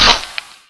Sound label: leaves Breaking; voxelverse